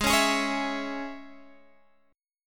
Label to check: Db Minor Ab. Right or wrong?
Right